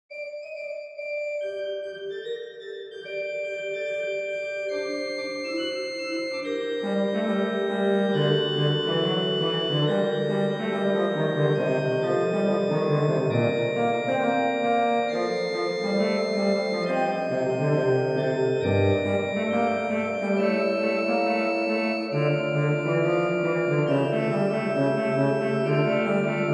Basse de Cromorne, Organ; Rodgers 945 Home Organ; Clarembault, Louis-Nicolas; Suite du Deuxieme Ton: Basse de Cromorne

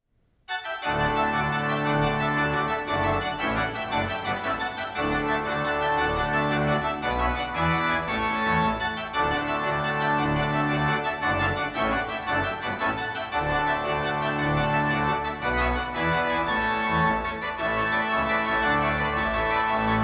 Rondo for Organ; Rodgers 945 Home Organ; Bull, John

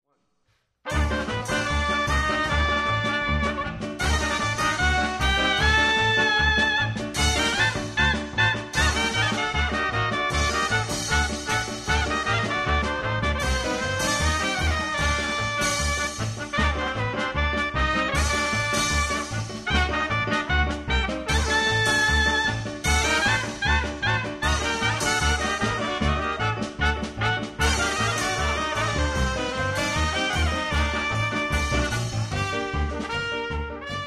Klezmer Medley; Living Stereo

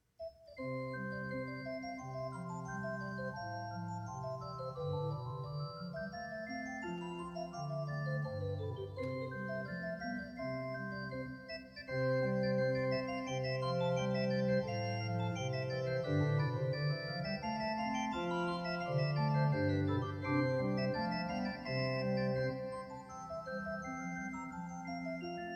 Hornpipe, Organ; Rodgers 945 Home Organ; Anon.; American Suite - 3. Hornpipe

